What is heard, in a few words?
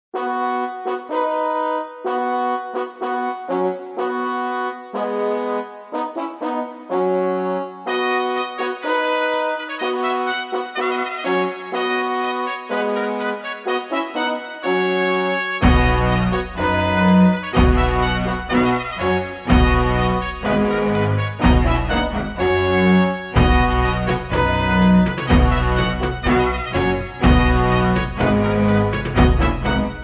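fanfare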